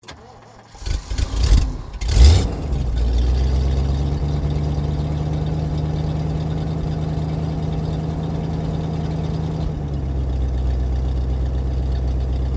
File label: Jeep Start Idle, Automobile, Cherokee, Laredo, Exterior, Start, Up, Idle, Shut, Off; Transportation; 1998; Blastwave